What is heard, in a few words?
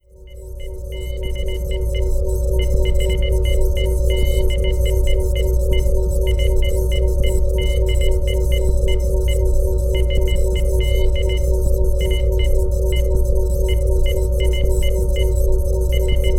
muzic; saperGame